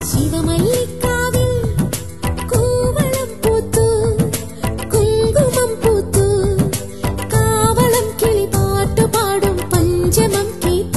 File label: sad malayalam melody